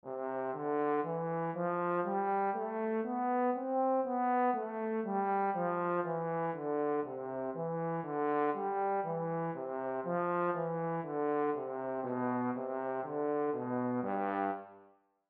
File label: Tenor Clef Example